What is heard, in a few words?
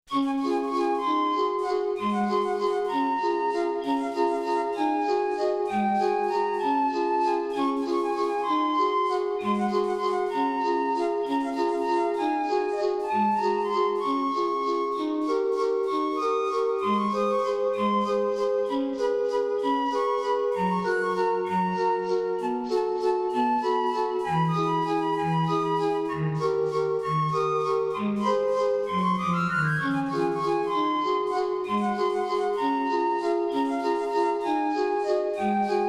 cartoon kirmes